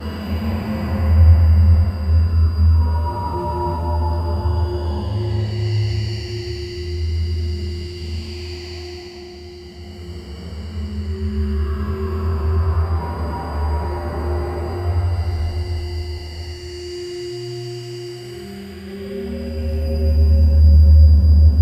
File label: background sound silent, bunker